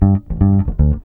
bass loop; AMG; Now CD-ROM (Roland)

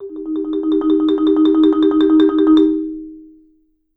Marimba G3, MRMBAROLL; Sampleheads - Dave Samuels Marimba & Vibes

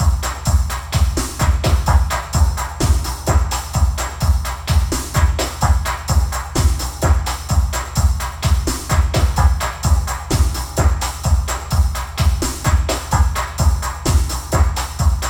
HOUS TAP, DRUM LOOPS; Zero G; Malice In Wonderland

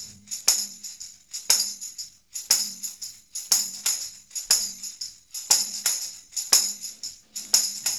SD tam; Percussion

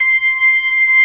B3 C 6, ORGAN; AKAI S-Series CD-ROM Sound Library VOL-8

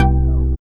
BASS LD

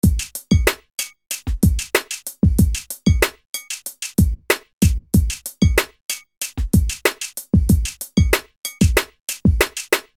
Beat

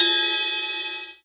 Ride One-Shots; Foundry (Sony Creative Software); Rondo Brothers Trip-Hop Loops & Samples; Hard Rocks The Roof

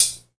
HH, HIHAT HIP, Dance Mega Drums

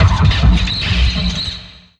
Vinyl Bumpers, Fx; Sonic Foundry (Sony Creative Software); Esoterik Beatz

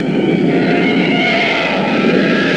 TIE IMP; STARS FXS; East West; Global Sounds

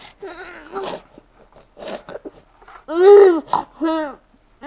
BABY CRY; East West; Global Sounds; BABIES

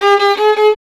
STR VLN, Solo Instruments; Miroslav Vitous

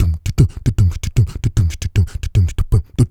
SKIP ROPE, Vocal Blues Soul; Spectrasonics; Vocal Planet

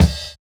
Kick, jungle breaks drum sounds

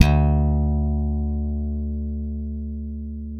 ALEMBIC BASS THB D 2; Masterbits CLIMAX 7 - Bass

